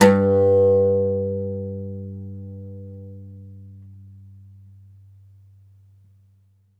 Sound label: OUD G1, Plucked Strings; E-MU Producer Series Vol. 7 – Old World Instruments